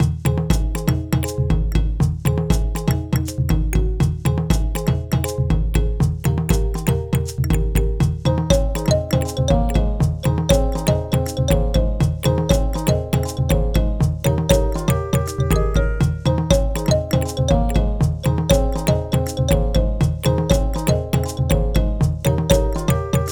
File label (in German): Musik